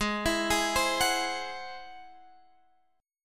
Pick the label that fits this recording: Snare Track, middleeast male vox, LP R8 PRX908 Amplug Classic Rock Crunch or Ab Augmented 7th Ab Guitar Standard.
Ab Augmented 7th Ab Guitar Standard